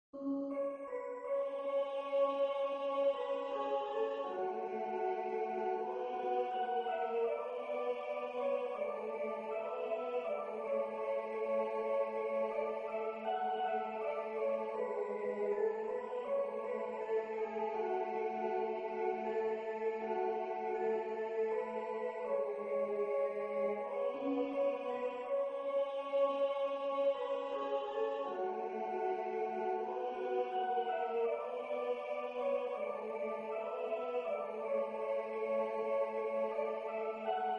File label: tenor solo